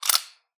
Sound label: coins handling